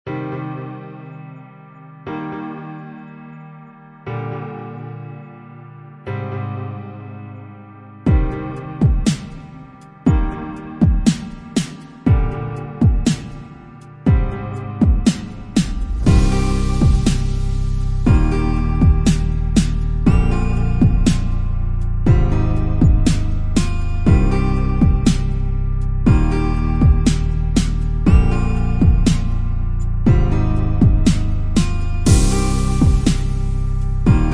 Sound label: ambient song thing